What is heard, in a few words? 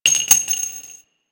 Shell, Weapons; Dual Vipers